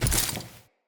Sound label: Walk Vegetation, Footsteps; Enemy; Characters; Lady Deora